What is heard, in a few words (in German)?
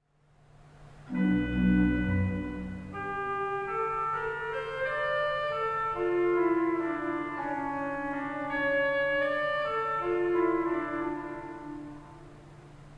Orgel, pfeifenorgeln; SW-Gedackt 8'+Oboe 8' als Solo